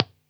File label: acoustic 2x12 PG57